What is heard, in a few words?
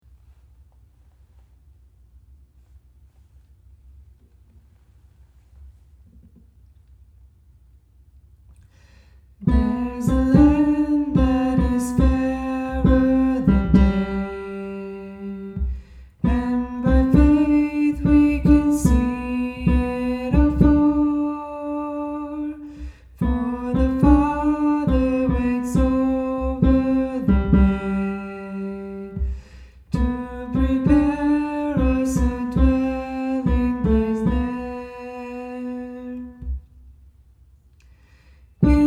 Tenor